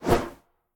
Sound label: swish; Smite; god Lancelot